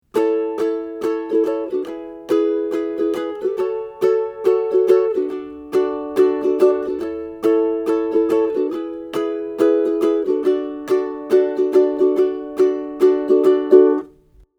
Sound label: Wagon Strum, ukulele